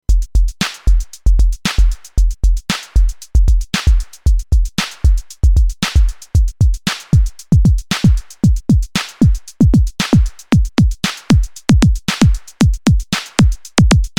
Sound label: Bassdrum